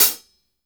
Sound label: hit, hi-hats